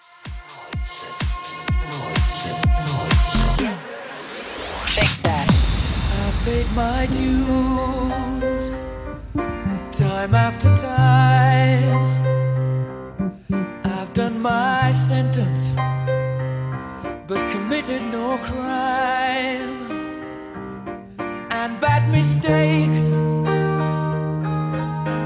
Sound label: Quick Bootleg 126; 2023 NBA Make Some Noise